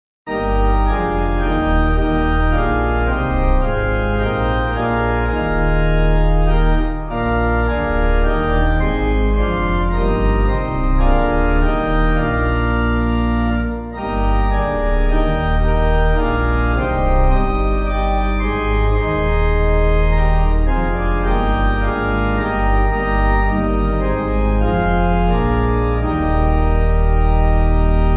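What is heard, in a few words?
Pipe Organ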